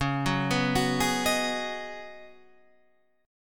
C sharp 9th Suspended 4th C sharp down Guitar Half Step Down, chord; C#9sus4